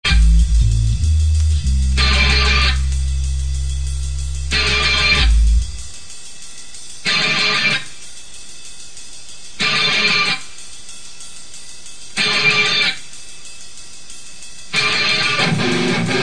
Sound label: loading